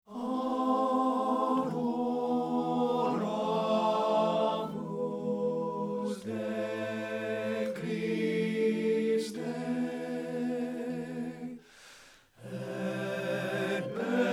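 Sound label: ADORAMUS TE TENOR; satb; partbypart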